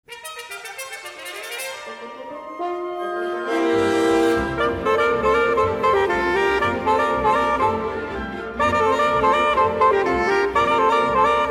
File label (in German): Konzert f Sopran u Altsax u Orchester